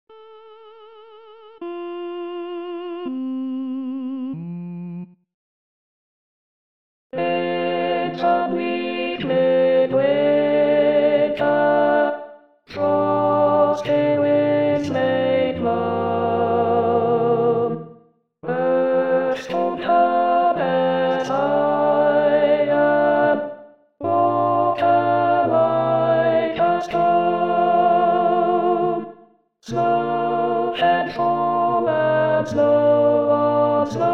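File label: In the bleak Alto; Holst; xmas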